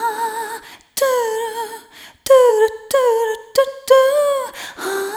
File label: Rhythmic A